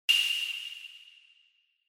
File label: Single Accent High